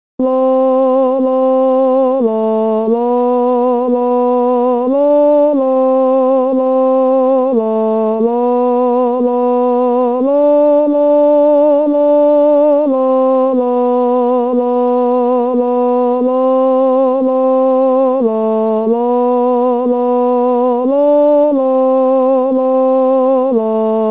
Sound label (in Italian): tenore; Dis Old Hammer